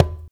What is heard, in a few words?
Congas, African Contemporary; Spectrasonics; Supreme Beats